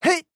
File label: Jump; Fern